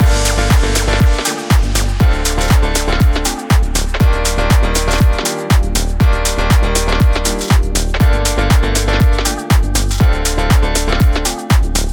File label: uplifting feel good dance loop